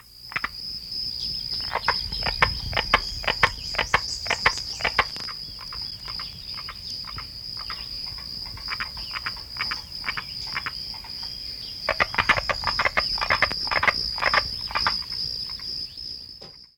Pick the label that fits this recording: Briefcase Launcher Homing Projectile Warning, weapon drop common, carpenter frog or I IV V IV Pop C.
carpenter frog